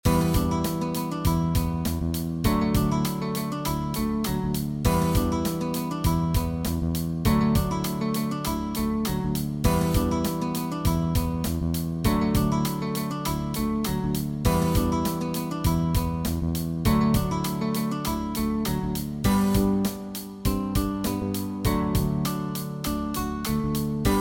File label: Riffs and Chords; compositiewedstrijd